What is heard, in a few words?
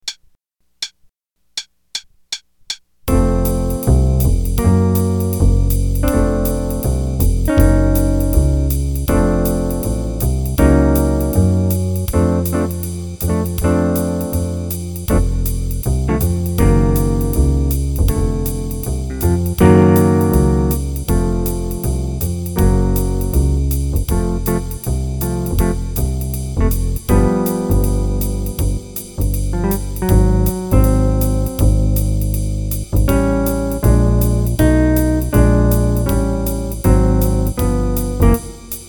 Play a long, 3 choruses qn=160, Jazz Improv Play-a-Long